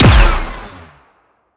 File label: Sciweap Shot Medium Gun Rifle Ripper